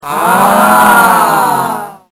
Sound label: crowd Ahh In Approval